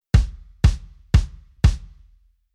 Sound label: Rock BD, Rhythm